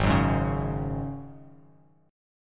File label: G sharp 7th Suspended 4th, Bass Standard; strummed; chord; 4,9,6,6